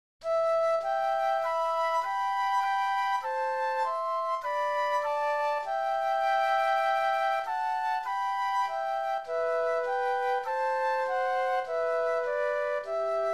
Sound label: Flute Duet; christmas